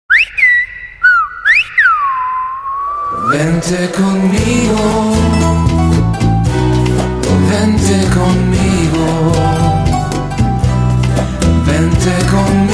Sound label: Ringtone